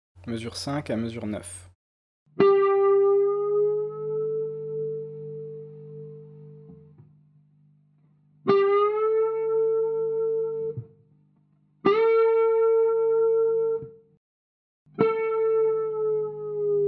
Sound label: bend serie